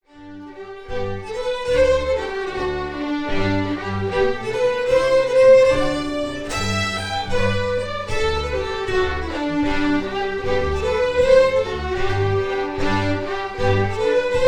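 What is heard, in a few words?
Quick Step, Scottish Fiddling; Winter Jink 2016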